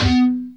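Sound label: heavy res guitar (Bb); Sonic Foundry (Sony Creative Software); Ugly Remnants VOL-2; Single Shots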